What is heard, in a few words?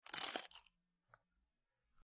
Bite Carrot Juicy; punches kicks hits bones